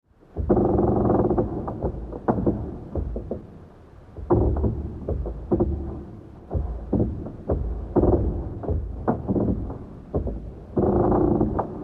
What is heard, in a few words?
Very Low Reverberant, door